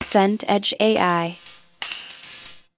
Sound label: footsteps heels tile